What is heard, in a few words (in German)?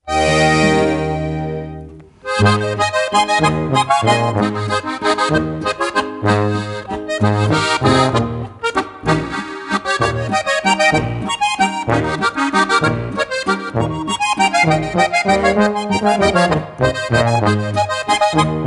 Harmonika